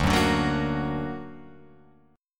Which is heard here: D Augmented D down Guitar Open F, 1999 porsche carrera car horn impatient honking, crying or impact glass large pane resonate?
D Augmented D down Guitar Open F